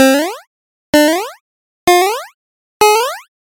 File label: SFX - Jump; Routed Sound; Serum 2 Expansion - Hyper Gamer Vol.1